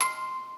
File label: musicbox